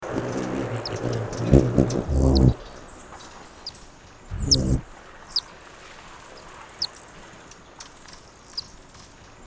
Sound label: Black crested coquette